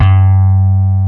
SLAP C4, BASS; East West; Global Sounds

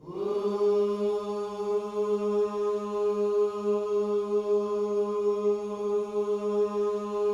WHOO G#3, gospel; Spectrasonics Vocal Planet

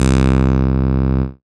Acid Bass, Dance Synths

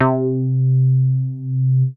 C3, BASS; Q Up Arts; Dream Experience; BASS BANK 15

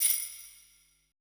E SHELL, ETH PERC

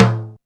TOM HI; Back In Time Records; Big Bang; THE ROOM

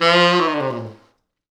T Sax falls, Horns Sax

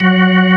ORG VK, B-3 ORGANS Vinta Keys B3

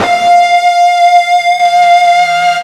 FUZZ F 4, Guitar; East West; Steve Stevens Guitar Samples Collection

